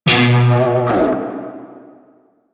Shawzin Flourish Oct One B; Music; Warframe; Narmer Shawzin

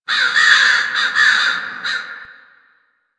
Fall Crows; Ambience; Warframe; NineteenNinetyNine

